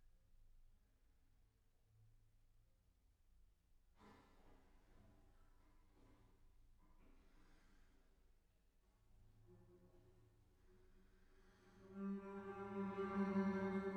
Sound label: Bass